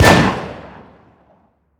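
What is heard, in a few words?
weapon mike203 fire player atmos int, launcher; gmod; viper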